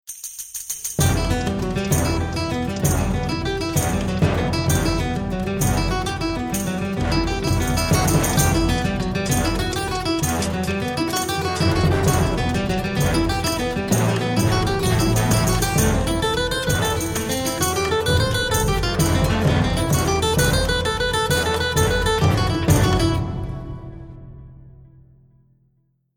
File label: start round